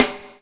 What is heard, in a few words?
BUBL, BUBBLE; Spectrasonics - Backbeat